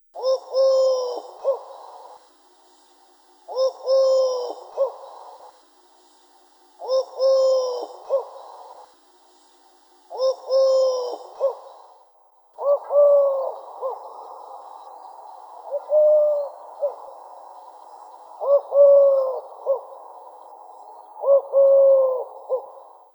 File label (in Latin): Streptopelia decaocto